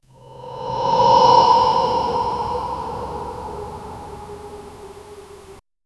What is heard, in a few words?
soft chime gentle wind a si